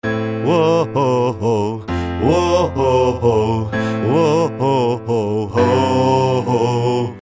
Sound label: vocal doubler; Verdopple die aktuelle Stimme